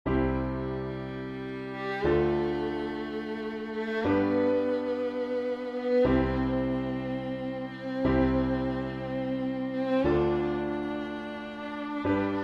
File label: Violin; Som Prava cesta